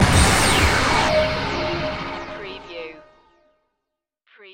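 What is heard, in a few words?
Production Element Hit Synth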